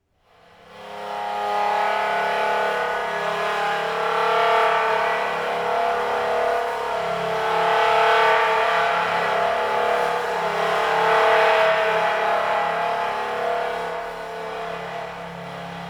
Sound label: multiphonic, bowed cymbals